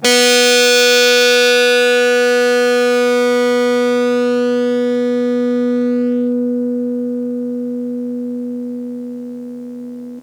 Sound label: Blues Open B4, Blues Guitar